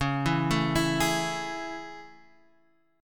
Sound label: A Major 7th C sharp